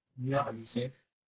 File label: feedback confirm